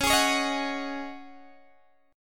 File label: Db Suspended 2nd Suspended 4th Db Guitar Open G